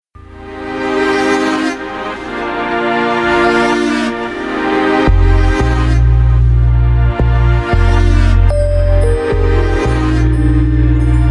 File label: lounge